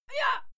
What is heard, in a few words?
female fight scream short; schreie; menschen